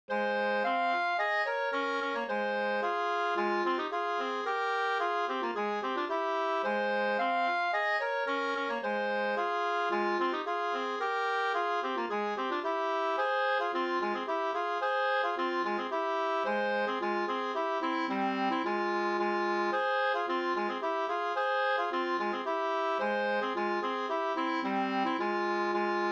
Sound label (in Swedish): polska polska